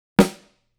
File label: snare